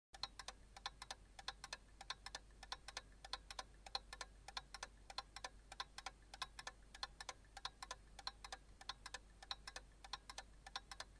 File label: Message Clock Tones